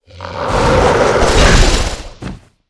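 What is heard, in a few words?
moth attack, monster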